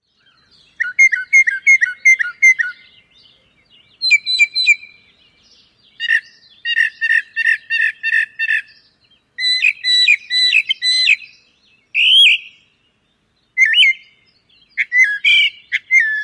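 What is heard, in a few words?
song, northern mockingbird; NY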